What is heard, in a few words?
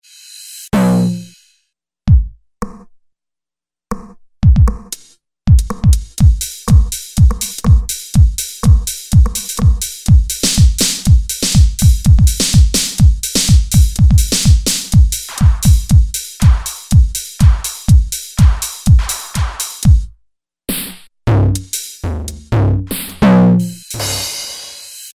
Rhythm Kit; Yamaha A4000; DJ - Producer Tool Kit